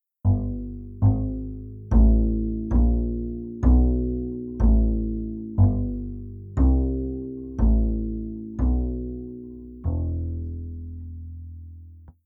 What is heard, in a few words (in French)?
contrebasse trio